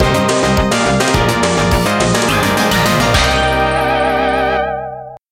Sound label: Stage Clear; Castlevania - ReBirth